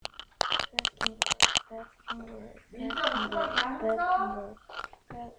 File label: basketball